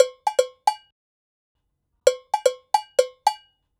116 Cow Bells

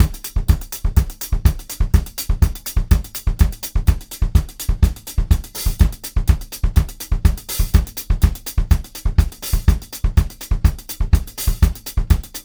124 Live Drums

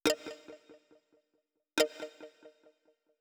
Syn Panther Pluck

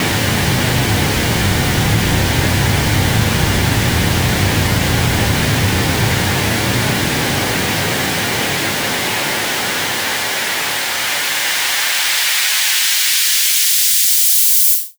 Fx

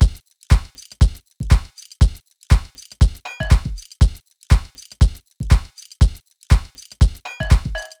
Beat 120bpm; Zenhiser

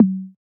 Bongo Perc

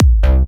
Kick & Bass, C, 126bpm

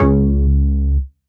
Oneshot Bass C

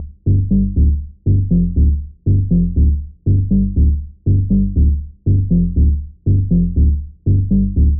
Bassline Dm 120

